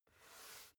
basketball turn swipe short

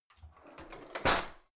old drawer creaky out hard

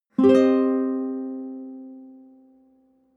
nylon guitar chord high minor

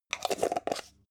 jar plastic midsize close

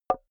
wood board knock

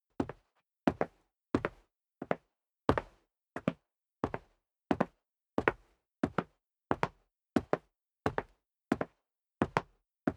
step sneaker concrete walk sequence

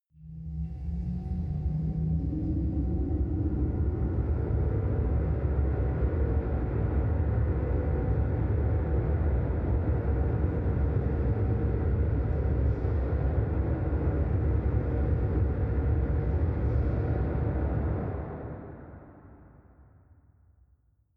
machine room dark

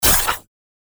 short lazer

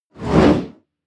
whoosh

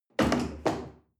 chair wood place medium

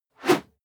whoosh whistle hard

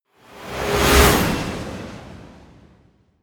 flyby

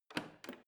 plastic button creak press

